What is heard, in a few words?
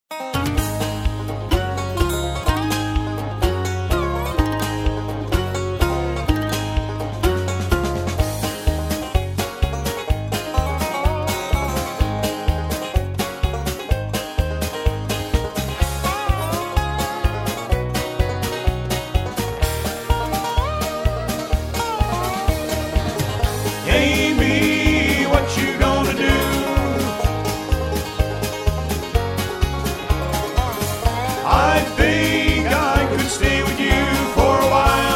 harmony sample